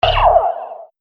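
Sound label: Sci-fi Game Laser Shot; Laser Gun Sound Effects; Andrew Fleming; Twistedtracks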